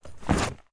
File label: fireaxe holster; tfa starwars melee weapons pack